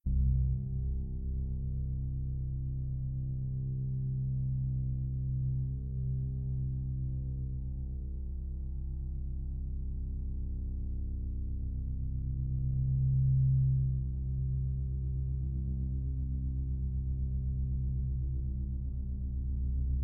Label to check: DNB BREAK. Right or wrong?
Wrong